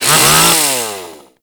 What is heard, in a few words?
Ghoul Sawman Swipe; Warframe; Grineer Ghouls